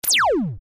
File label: Laser Gun; lasers